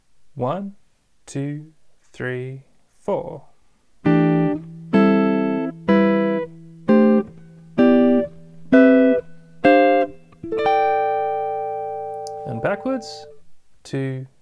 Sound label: C Major Chords Harmonized